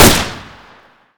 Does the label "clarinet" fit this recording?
No